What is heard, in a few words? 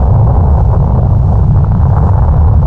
telekinesis charge